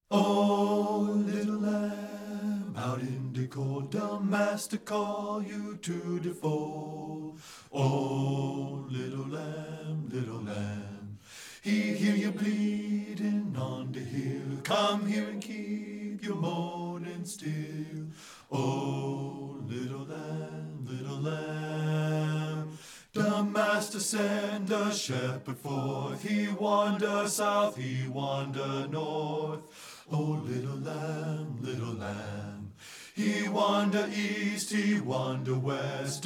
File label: bass, choral